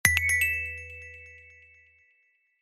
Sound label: voicemail ringtone